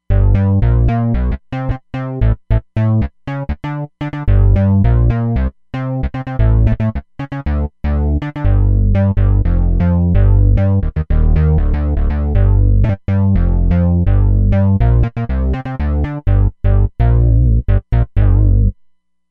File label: Reso SynBass, Roland Fantom XR